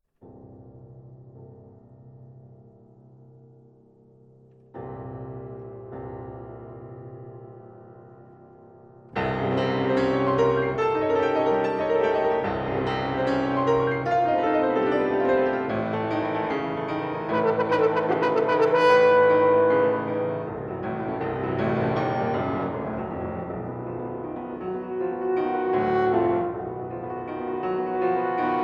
Sonata for Horn and Piano, Lento- Allegro molto- Presto